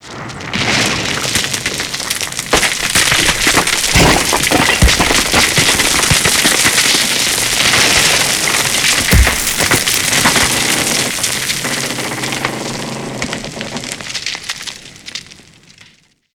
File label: rock dirt debris long; Call of Duty Modern Warfare